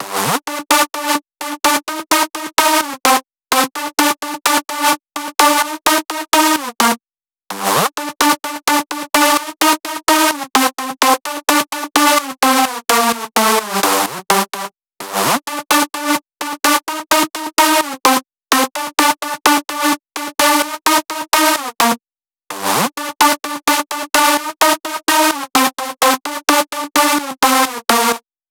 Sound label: 128BPM Synth Lead Main Dry SC, Root G; Vengeance - Essential Clubsounds Vol.5; VEC5 Demo Constr Kits; Early Morning Kit